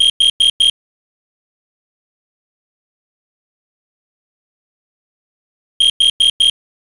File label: co detector temporal four tone